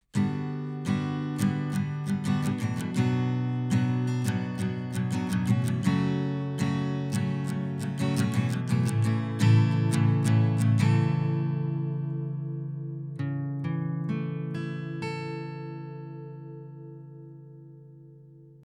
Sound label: fifine k688 guitar; динамічний мікрофон; FiFine K688 – динамічний мікрофон з можливістю підключення по USB та XLR кабелю